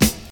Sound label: hip hop snare one shot f sharp key